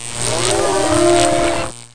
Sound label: howl die; Total Chaos Nukem 3d Pack; duke